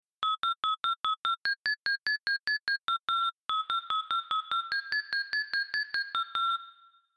Xylophone

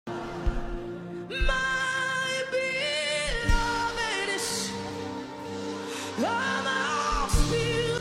worship session

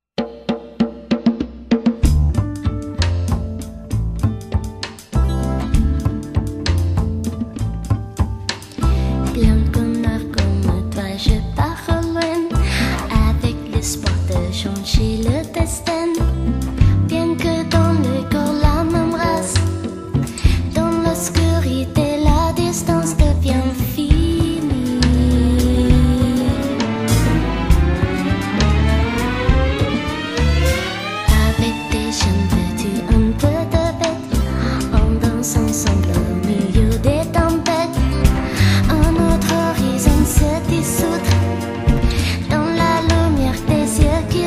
Valse; Wolf's Rain; Valse De La Lune

